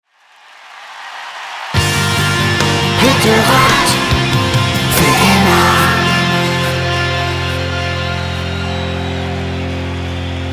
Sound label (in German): Tor Jingle; TVG